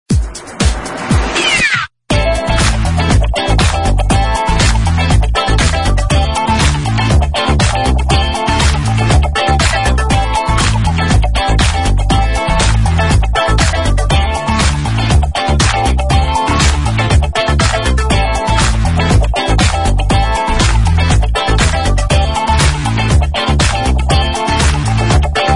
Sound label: Silicon Groove